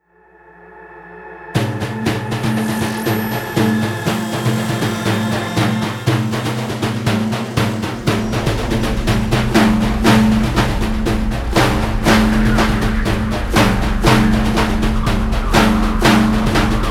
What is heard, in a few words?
Daf Rhythmic; آهنگ های دف